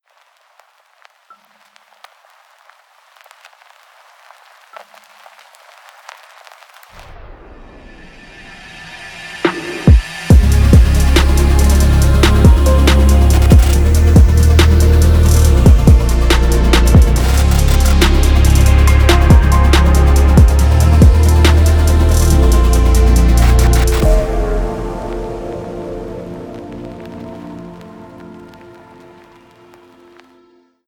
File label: cinematic